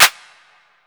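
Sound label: stereo clap, hi-tech kit; Crypton - DrumBase5000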